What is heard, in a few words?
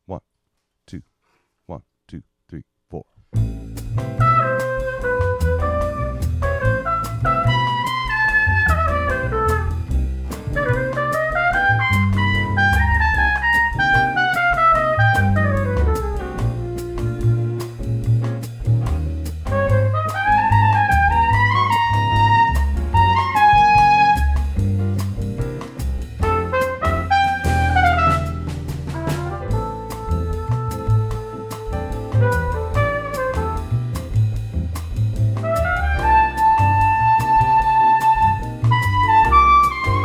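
lead trumpet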